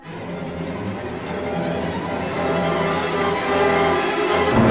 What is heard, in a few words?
Matchmaking Buildup Dark; lego star wars battles; Rebel Blockade Runner